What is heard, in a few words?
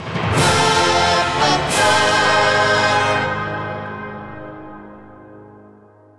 2nd Place Goal Fanfare; Evilocity; crash bandicoot series sound box